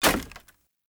Polearm Medium Impact Wood Hollow Large; Weapon Impacts; Player; Environment; Sphere Polearm

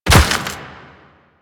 Step Dirt, Footsteps; Warframe; Quests; The New War; Kahl

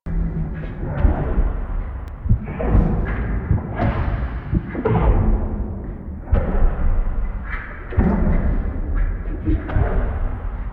Vault Heart Beat Broken Loop; Ambience; Warframe; Infested Eris

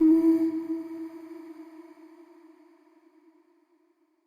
Statue Voice E, Player Instrument; Statues

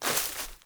Grass Step; Characters; Ambient; Steps; Sprout Farmers

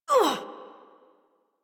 Pain, Adult; Warframe; New War; Adult Operator

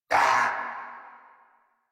Pain Grineer; Warframe; Grineer Chem Nox

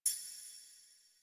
Duviri Tablet Select; Warframe; Easter Eggs

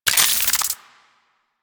Modular Secondary Handle Reload, Guns; SU Modular Guns; Reloads

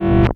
Corrupted Notes T; Warframe; Bard Quest; Bard Boss Fight

